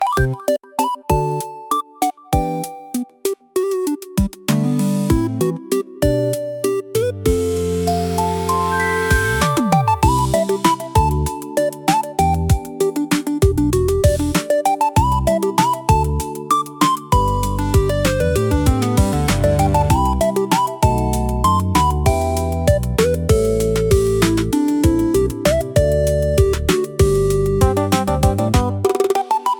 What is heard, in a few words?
Game Over Lullaby, retro game chip tune; pico pico sound